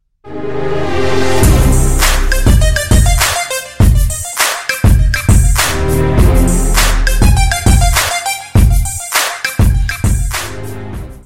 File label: music